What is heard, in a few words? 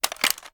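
weapon foley assault rifle reload fast shake; weapons; gmod; viper; scharlie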